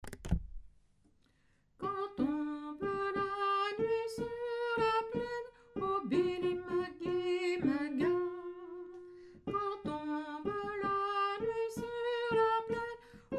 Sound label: soprano